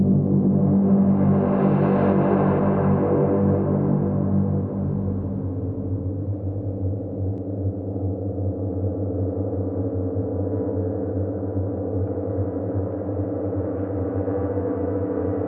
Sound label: Sulphur Light Loop, Horror Atmospheres Music; HauntedBloodlines